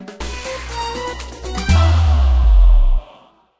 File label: Level Lose; SFX Game Level Lose